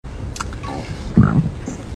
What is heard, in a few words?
MELODIC BELCHES